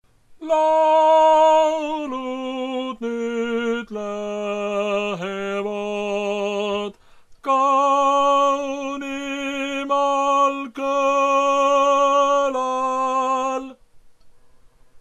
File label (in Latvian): tenor